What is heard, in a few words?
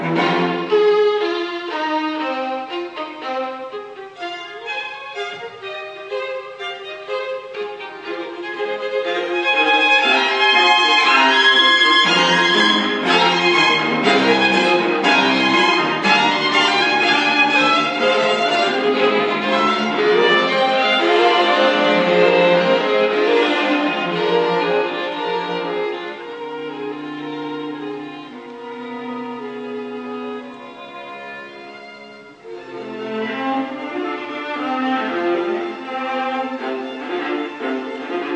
Quartet, Fugata; Dvorak American Quartet 1st Movement